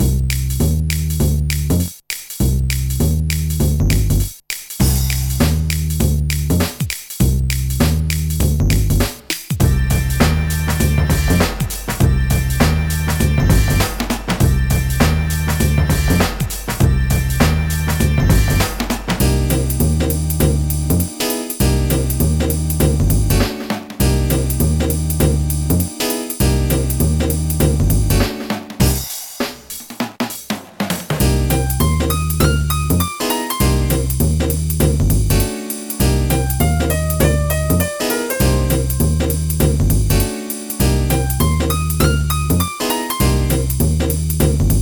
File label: mod new jazz mix; Trackers; Modland; Prophet